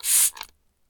Window cleaner Spray

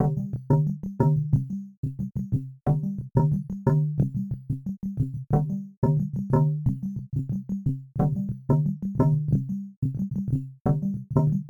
Nervous Beat

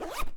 Zipper

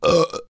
Burp

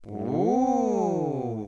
Ooooh

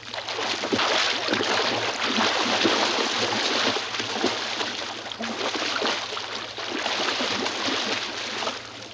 Swimming In Pool

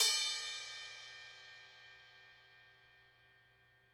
Crash A Custom 18 Bell

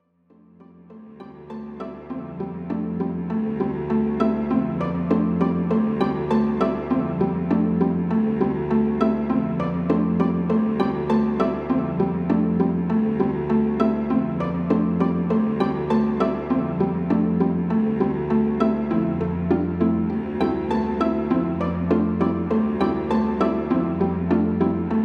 Harmonic Ambient Classical Theme

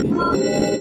Digital Menu Sound

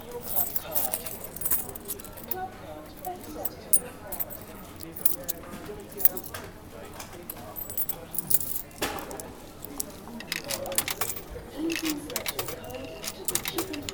Paying With Money

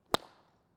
Golf Ball Hit

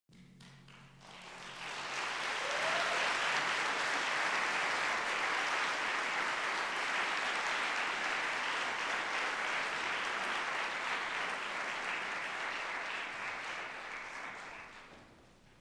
Applause

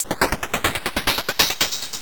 Glitchier Hat

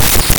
Glitch Element

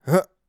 Breathing Jumping

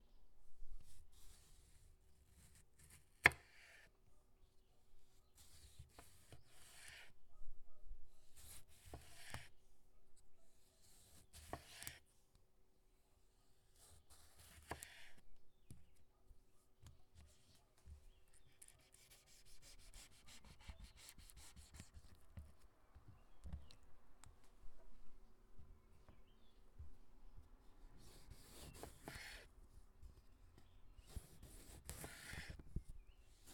Cutting Tomatos On A Wood Board

